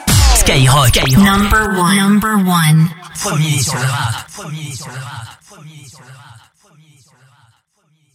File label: JINGLE; PREMRAP; SKYROCK